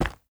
Footsteps Sneaker Rocks Tap

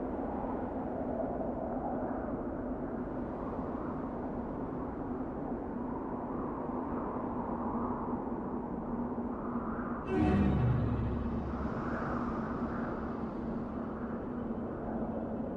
Bespin Ambience Clouds; Angry Birds Star Wars; android game music

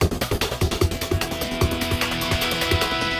Combat START, soundtracks

